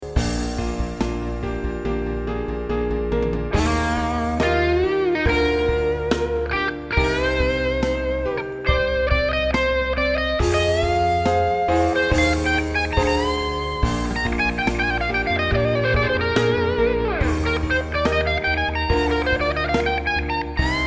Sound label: solo